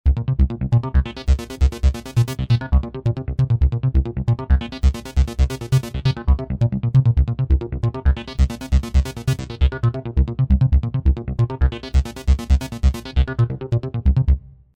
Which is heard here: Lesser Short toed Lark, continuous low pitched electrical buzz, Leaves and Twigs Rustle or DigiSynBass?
DigiSynBass